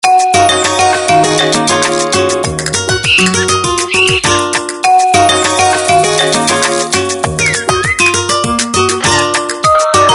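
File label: Flamenco, ringtone; Swisscom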